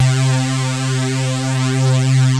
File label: LEAD, mono poly; TECHNO-BWARE